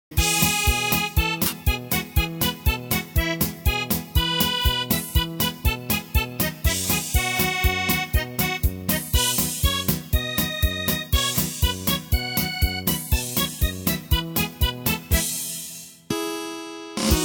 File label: polka